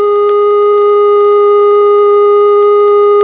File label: nutone, Telephone